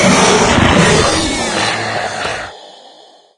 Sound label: death, wraith; doom3